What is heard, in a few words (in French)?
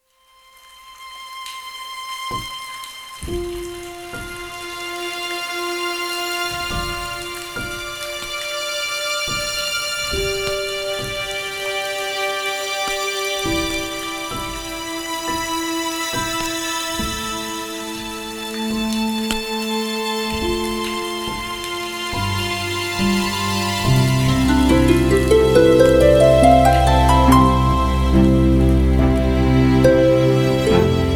musique rosée du matin